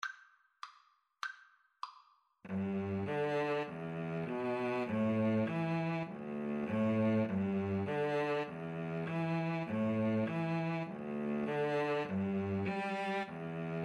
Cello Duet

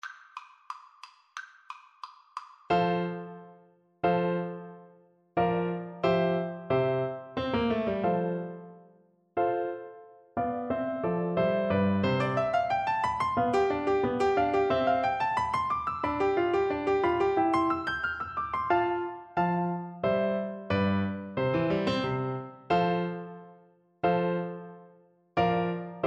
sonatina, Flute; clementi 1 op 36

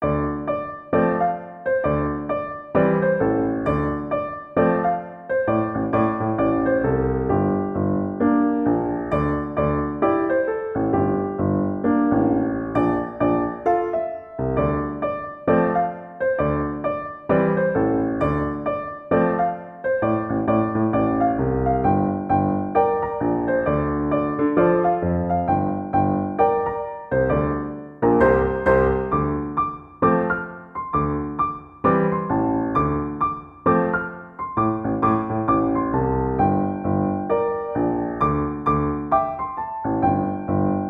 hip hop house piano

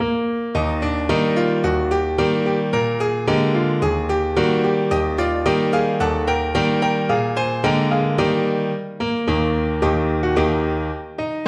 spanish cavalier, piano

